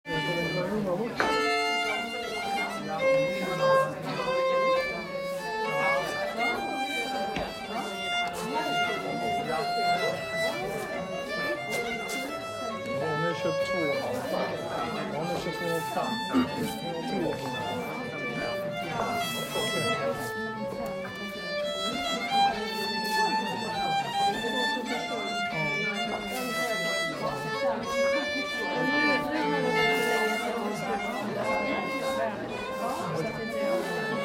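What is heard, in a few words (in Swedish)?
Polska, säckpipa; efter CW Rulin; Varvindar friska; Narke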